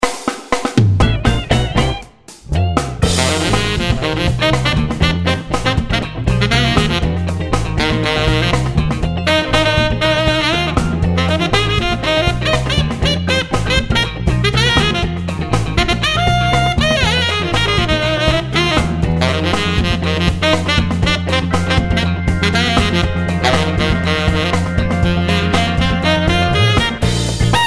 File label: Custom Tenor Funk; gottsu